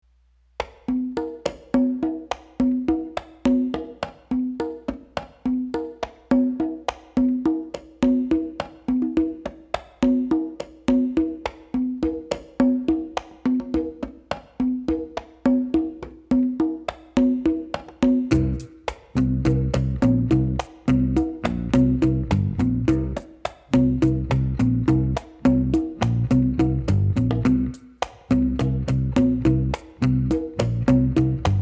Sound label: congas